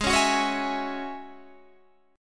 A flat 7th Suspended 4th Sharp 5th A flat Guitar Standard, chord; Ab7sus4#5; 16,16,16,18,17,16; strummed; chordshape; JGuitar